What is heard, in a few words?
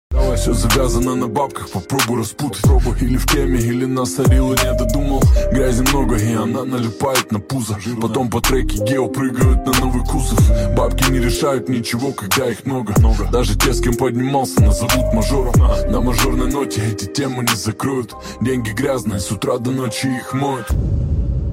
Kriminalnyi bit